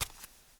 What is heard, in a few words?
Step concrete barefoot; yesterday origins gamerip 2016 switch ps4 pc ios macos